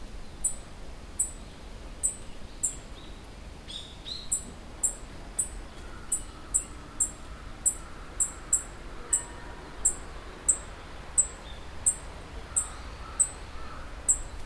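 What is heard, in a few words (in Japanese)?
aoji, black-faced bunting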